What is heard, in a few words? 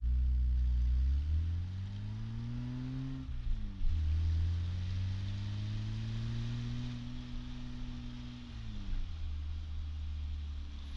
bmw 1502 bmw 520i exhaust rear bumper interior quad drive skid; fvtt sonniss gdc audio